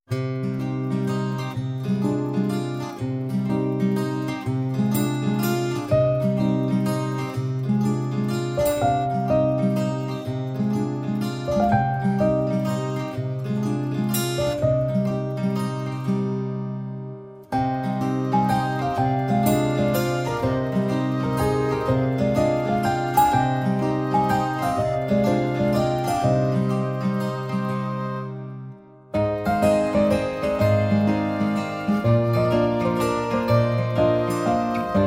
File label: Music Piano